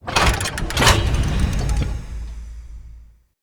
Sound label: Lever Pull Elevator; Encounters; Ode Pollution Tower